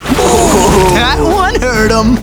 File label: hurt